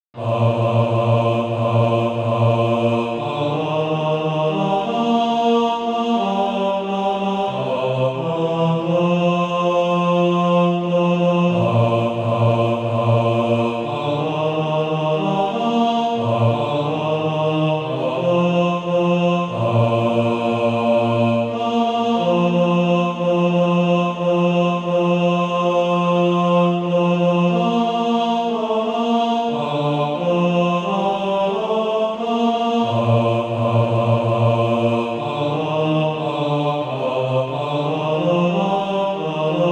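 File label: bas, Practise Music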